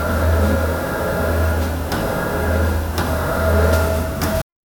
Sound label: sounds of a lab working, laboratory